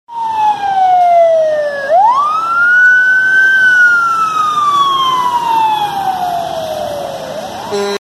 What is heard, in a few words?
Sirens